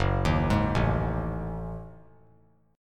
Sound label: Gb Minor 6th Add 9th Gb down; arpeggiated; JGuitar